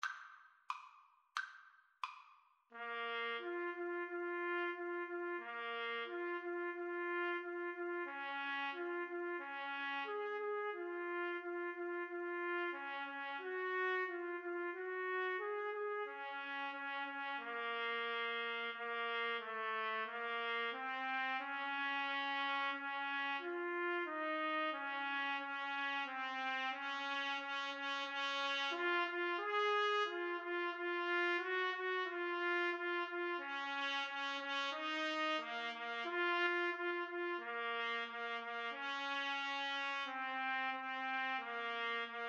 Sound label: capriol pavane 2 trumpet